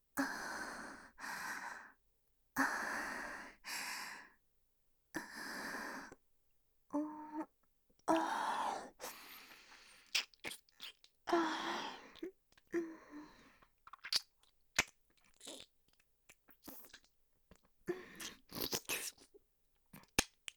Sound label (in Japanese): ループ キス, ず～っと、ねっとりしたディープキスをされたいんですよね